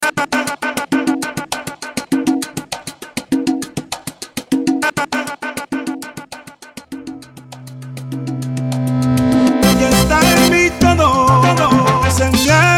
Intro Outro Break 100 BPM

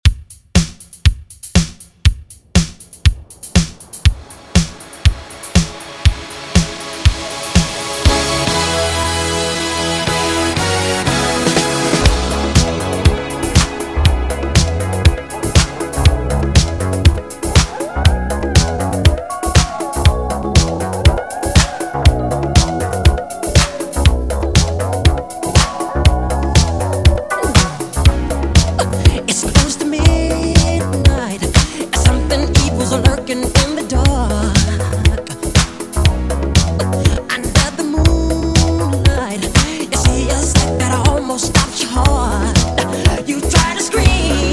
clean 120bpm

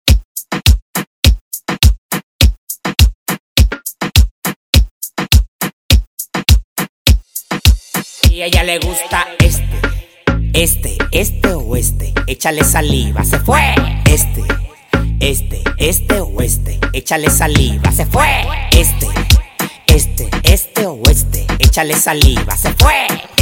REGGETOM RMX

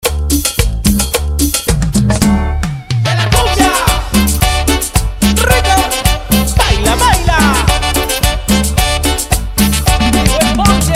Cumbia Extended 110 BPM

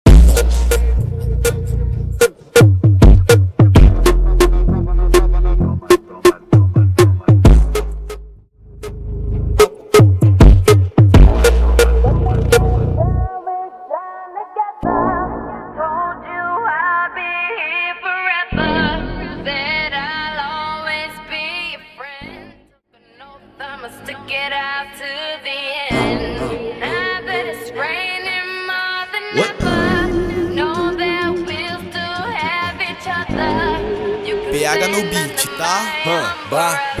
Funk Brasilero Intro Outro 130 BPM; Prodeejay Remix

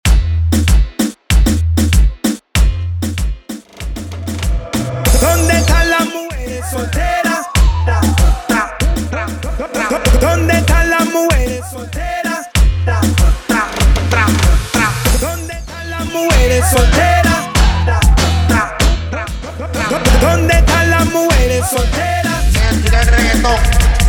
Intro Outro Break 96 BPM

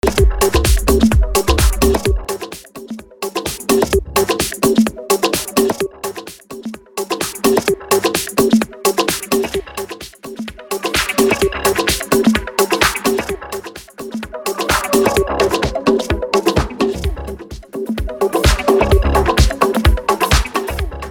afro house 128bpm; remixer from Nicaragua

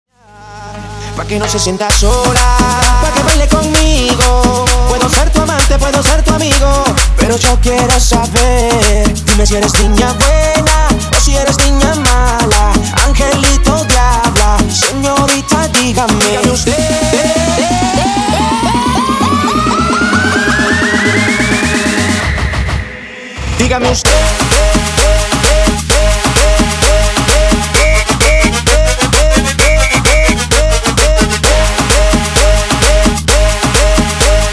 tribal remix 130 bpm

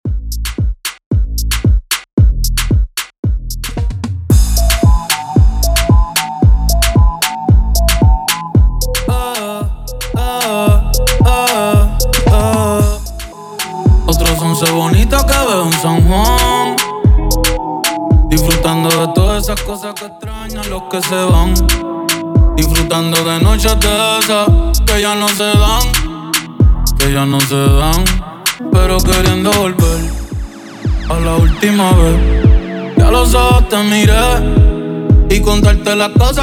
Intro Outro 113 BPM

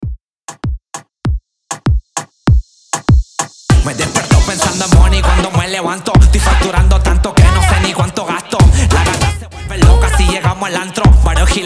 intro outro 98 bpm

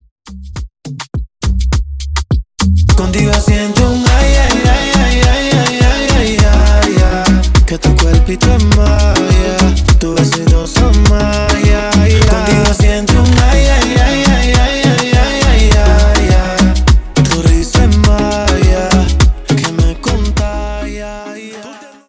Intro Chorus 103BPM

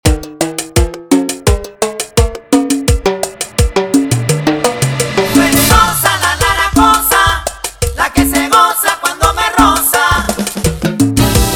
rosa 85bpm

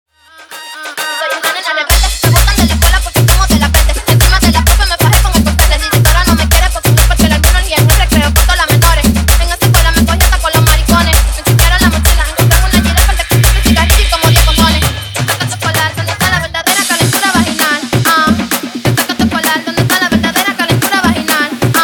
intro outro 130 bpm

